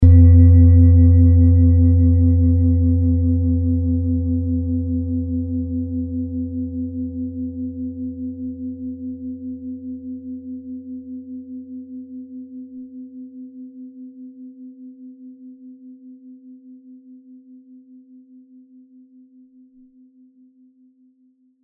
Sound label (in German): Beckenschale